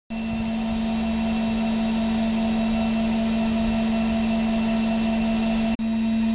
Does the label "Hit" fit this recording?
No